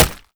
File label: flesh npc, bullet impacts; physics; Half Life Model Pack